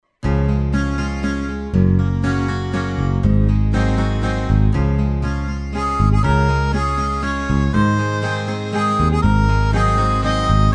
Happy Birthday to you in Db

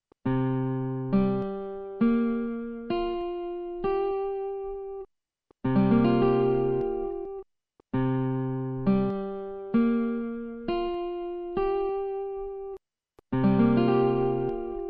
c7sus4 guitar chord

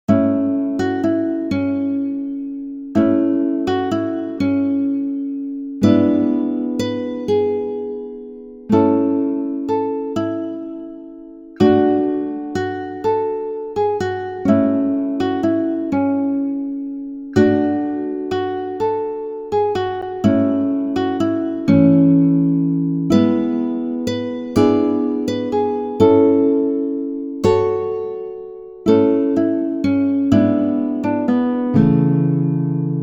guitar, A; Stille Nacht

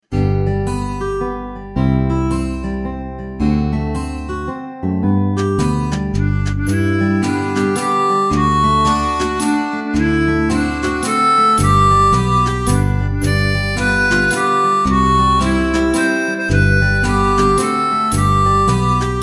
Happy Birthday to you in G

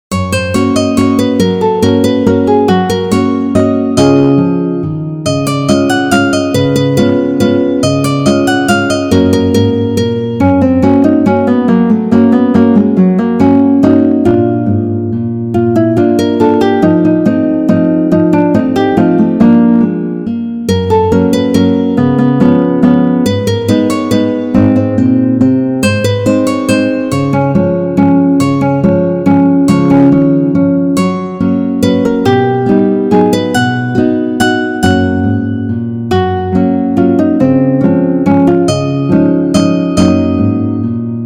Waltz, guitar; Fledermaus; Strauss

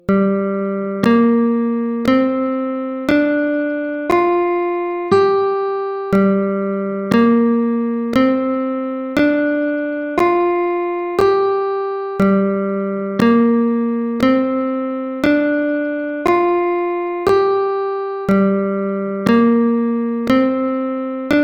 G Pentatonic Minor scale

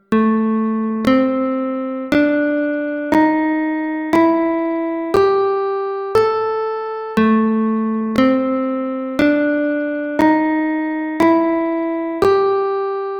A Blues Scale

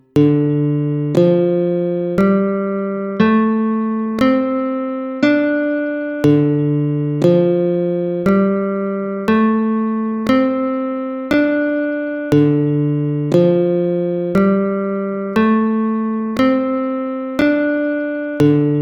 D Pentatonic Minor scale

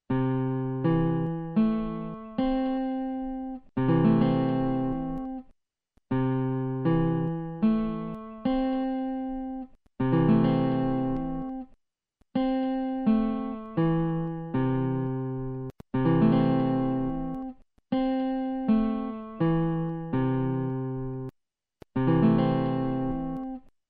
c6 guitar chord

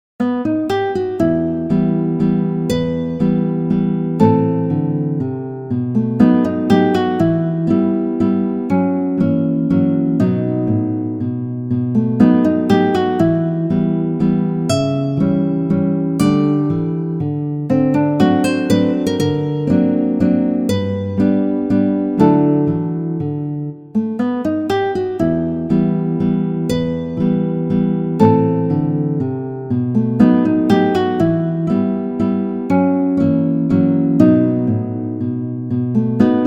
Ballade Nr 1 Chopin guitar

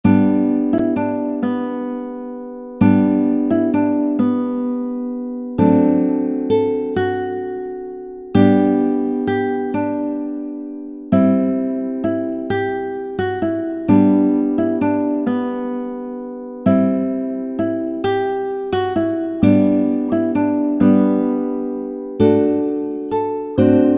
guitar, G; Stille Nacht

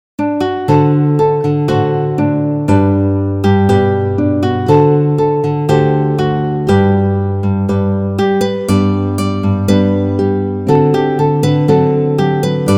Der Fruehling hat sich eingestellt guitar

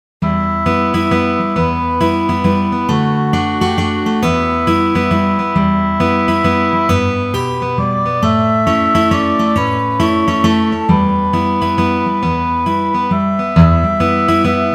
Scarborough Fair, guitar

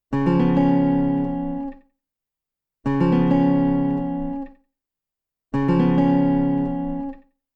Cis7 Guitar Chord

